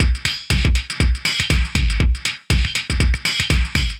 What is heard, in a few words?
Layer Dance Kit, Drums; Amplify; Ableton; Factory Packs; Vibrations by ModeAudio